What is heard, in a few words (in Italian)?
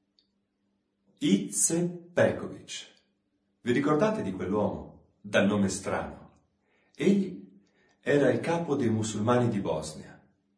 Voce fuori campo, per doppio pianoforte; Il tempo subliminale (Paolo Ferrari)